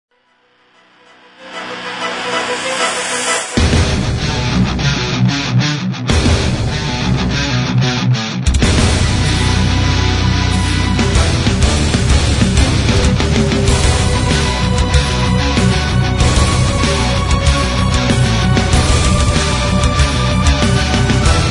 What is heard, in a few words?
Symphonic Metal